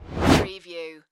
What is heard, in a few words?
Whoosh; Sound Effects Bible